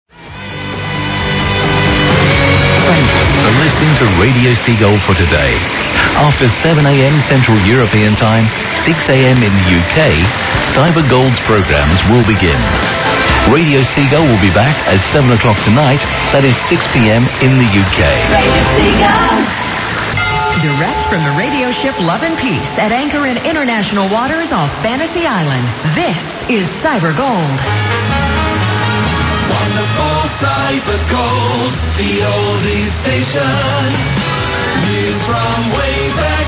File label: interval signal; cyber gold 1602kHz